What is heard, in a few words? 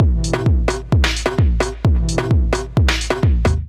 Kit Melodic 130 bpm, Drums; MIDI Clips; Ozark Glitter Kit; Ableton; Factory Packs; Skitter and Step